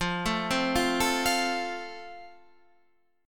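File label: A Minor Sharp 5th, chord strum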